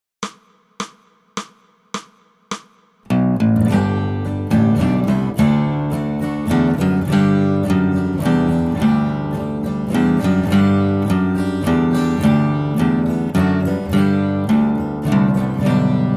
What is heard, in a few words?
Bass Solo; First Noel (bass solo)